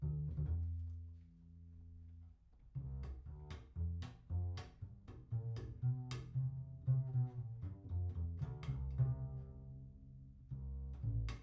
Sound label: Chordz